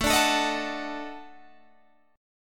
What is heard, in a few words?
D sharp Minor Major 7th Flat 5th